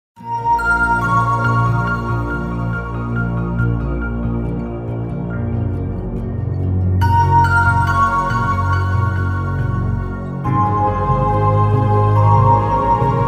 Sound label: Instrumental - Flute